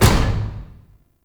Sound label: Service Door Close; Con Junction; Warframe; Ambience; Entrati